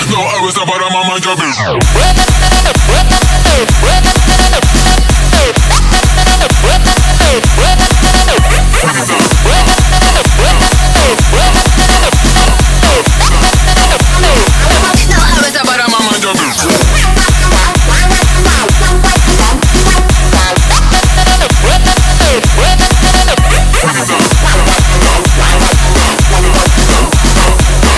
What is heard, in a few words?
Electro House